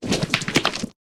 ability stone wall up

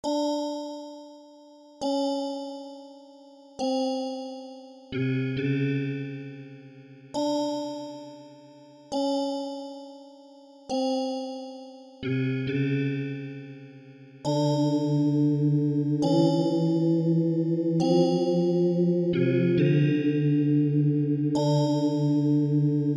Dark Scene

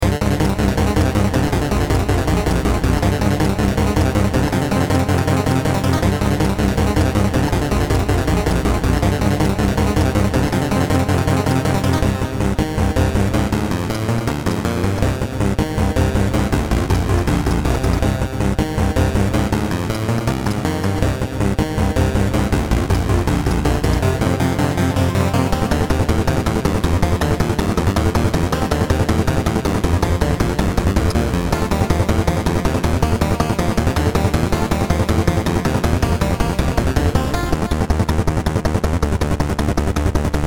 alt boss no intro, platformer chiptunes

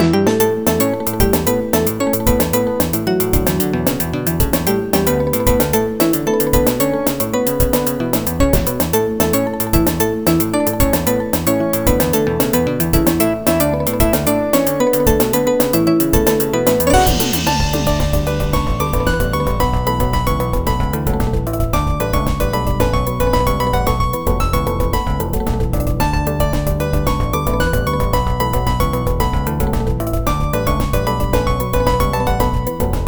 new drum tropical

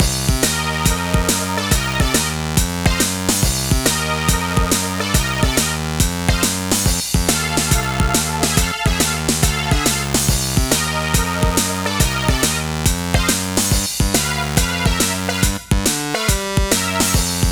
jazz n brass loop

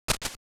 sfx gift wrapping opens; Jay The Doggo

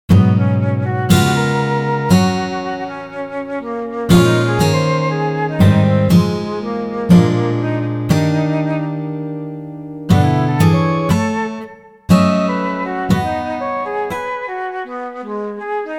flute and guitar

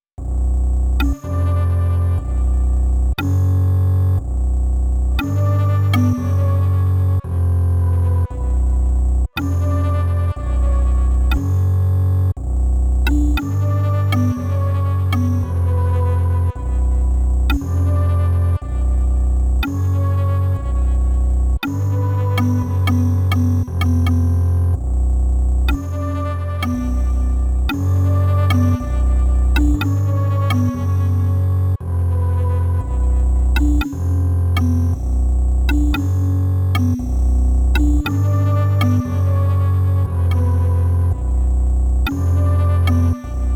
snes metal

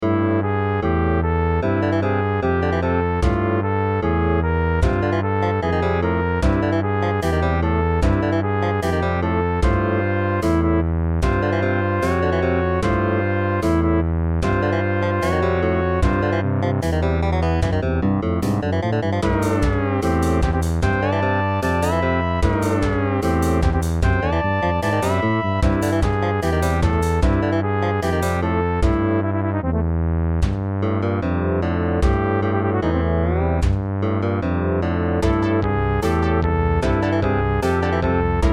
tension

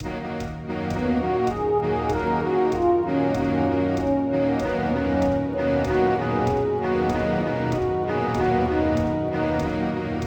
beat; beat trial

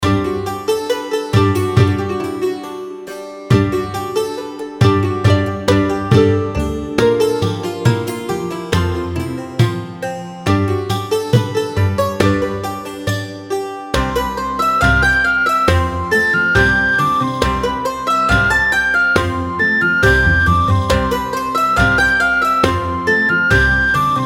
Loop Rejoicing

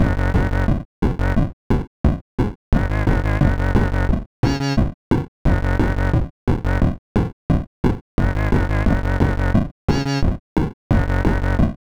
8 Bit Bassline

